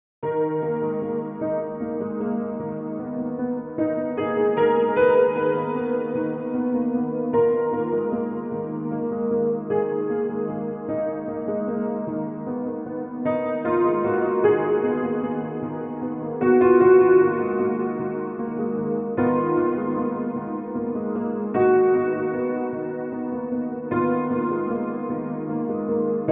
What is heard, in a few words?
Troubled Waves